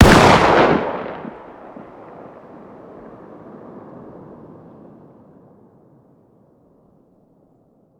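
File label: fire distant; gmod; arccw uc commons attachments; ub12ga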